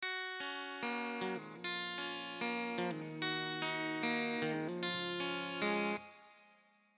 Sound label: strato wet; Chiqui Audio; Free Wet Arpeggio 9 150 Bpm F Maj; Fender Stratocaster